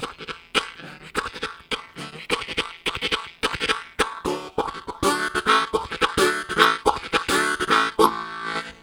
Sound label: Kicking, Harmonica Riffs; Zero G